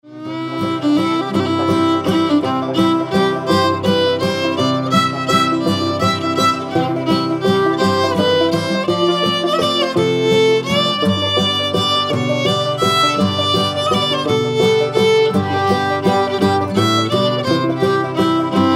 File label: waltz; Vigortone Records